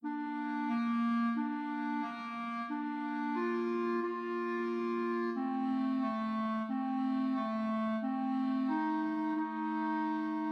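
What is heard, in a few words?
beginning clarinet duet in C major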